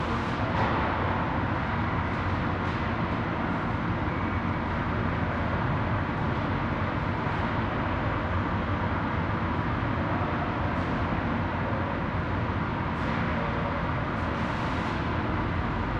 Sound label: ambient port default; World Of Warships